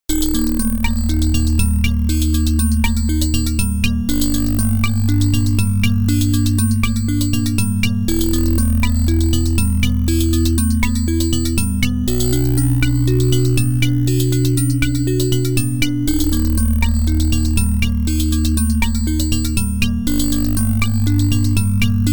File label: Water (Adlib); Dune; MS-DOS